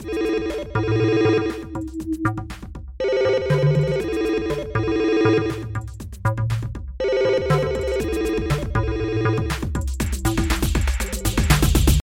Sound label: RingTone